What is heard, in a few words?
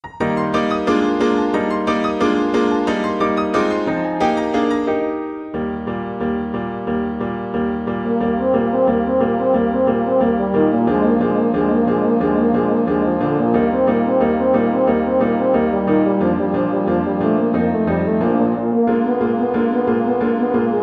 euphonium